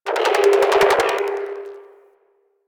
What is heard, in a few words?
Electronic Gust; Ambience; Warframe; Corpus Railjack